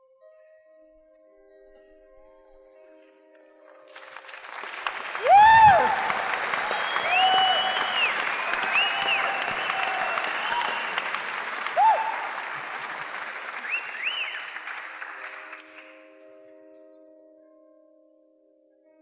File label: Piano Improvisation